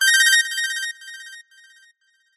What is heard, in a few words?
multimedia alert digital bright beeps synth ping attention; zapsplat